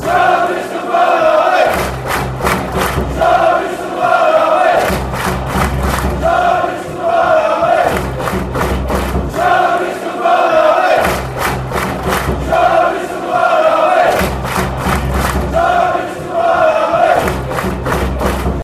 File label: barmy army, chants; sean odriscolls